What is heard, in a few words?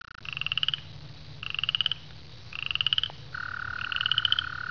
p. streckeri, frogs